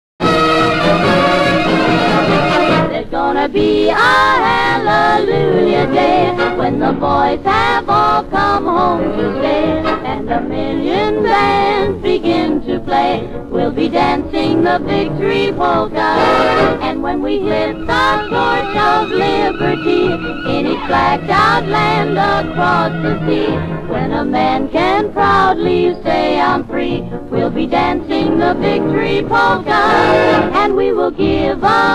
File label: Polka